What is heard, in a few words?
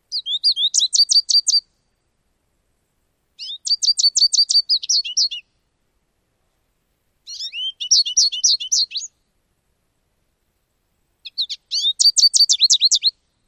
brief songs summer territory